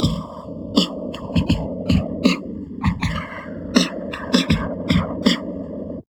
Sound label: DIST, Drum Loops Crazy Processed